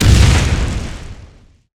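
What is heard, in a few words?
Enemy Hourglass Minion Active Hit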